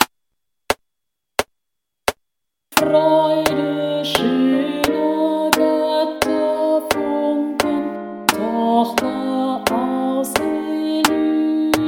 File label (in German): Ode an die Freude klassisch Alt